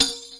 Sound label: arabic perc, Arabic Percussion Kit